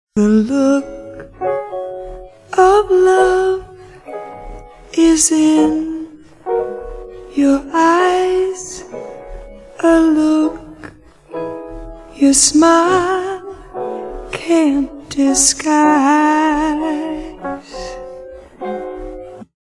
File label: piano voc only